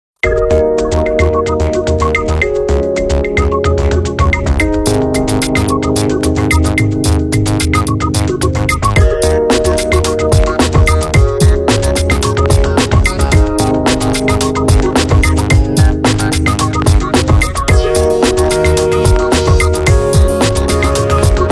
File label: Progressive, Tones digital; Nokia; E5